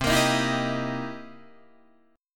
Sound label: C 7th Sharp 9th, Guitar, Open D